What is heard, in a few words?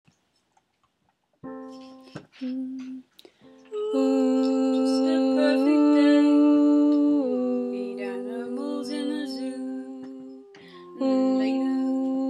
upper accompaniment